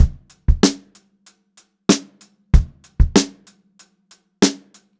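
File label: STRAIGHT VARIATION, Funk-Groove Drumloops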